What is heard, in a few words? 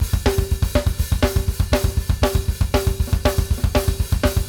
DBL UP + HAT, Drumworks